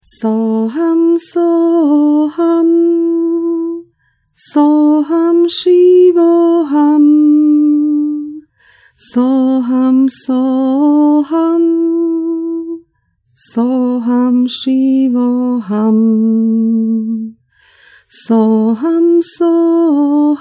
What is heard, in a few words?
So ham, mantry